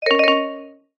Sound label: cartoon flutter vibes